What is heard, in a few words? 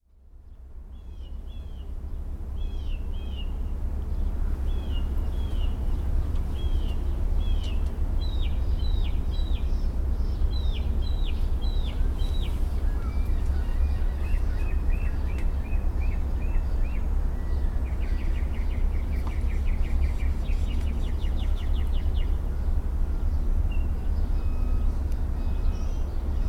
mockingbird